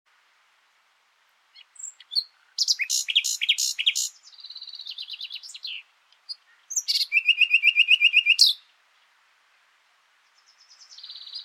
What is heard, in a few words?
Luscinia megarhynchos; SETE